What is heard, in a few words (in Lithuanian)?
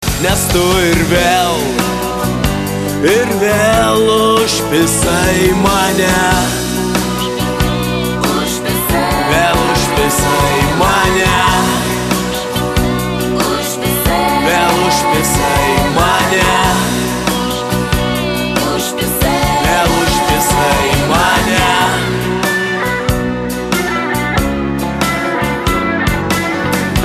bliuzas ringtone